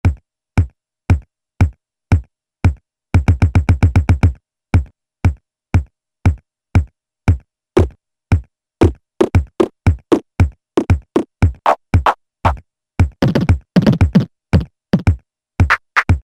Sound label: Ensoniq ESQ-1 KICK